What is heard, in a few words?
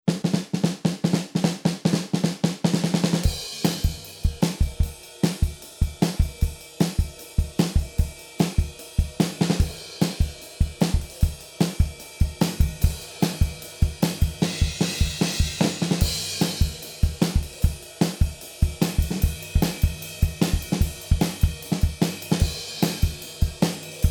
Bass; Bass Lord